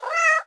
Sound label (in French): chat